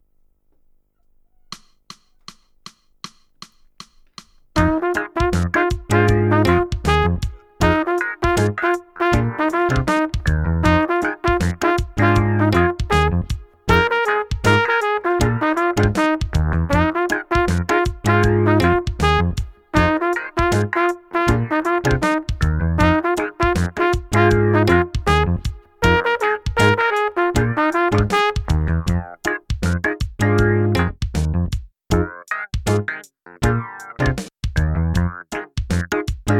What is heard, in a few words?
five note funk